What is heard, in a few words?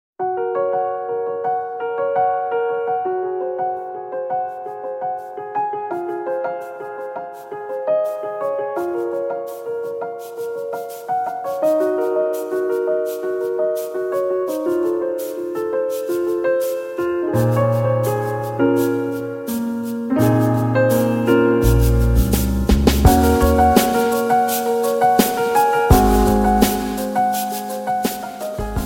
Chill Out Piano